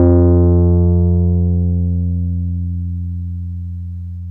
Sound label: PNO Wurly, F 2; Keyboards of The 60's and 70's